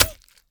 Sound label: bee sting; mob; Minecraft